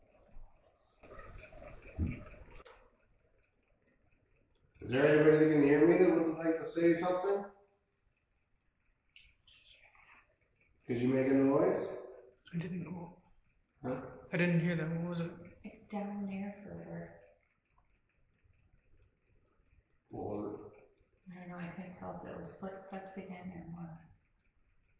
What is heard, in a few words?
Mental Ward EVP; fairfield county infirmary return investigation